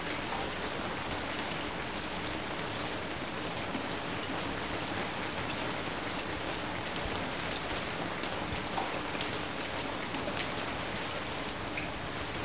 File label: Light Rain Sound; UltimateFishing2020